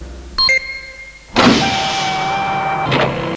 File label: Gate Moving